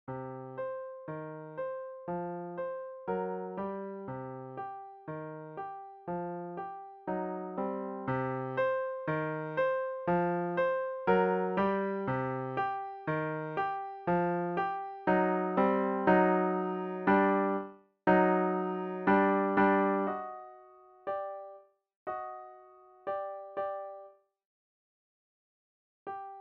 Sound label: Zigzag Jazz